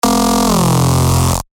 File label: F, bass shots; Ogre; revealed bass shots vol 3